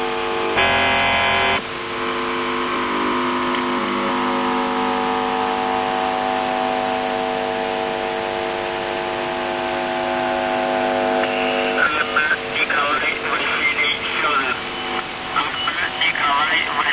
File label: buzzer usb msg